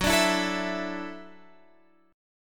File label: F sharp Minor 7th Sharp 5th F sharp, Guitar Drop D